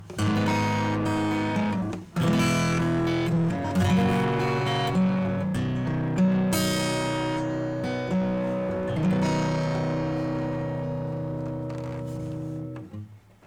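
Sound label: Funk Guitar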